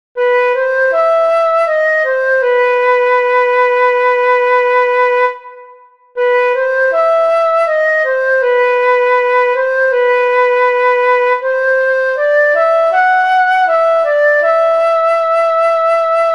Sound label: For Flute; Mokhtarnameh; مختارنامه